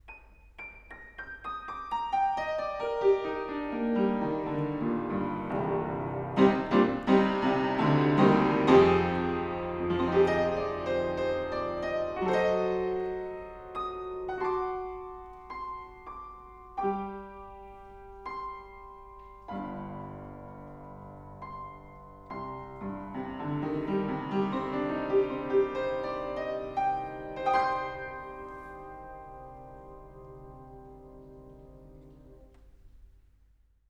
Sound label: upright panels on 1m pair